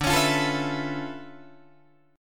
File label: D Minor Major 13th, down, Guitar, DADGAD